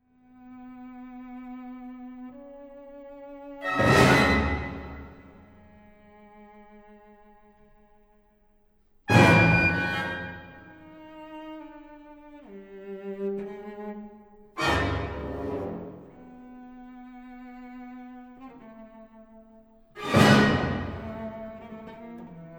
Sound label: cello concerto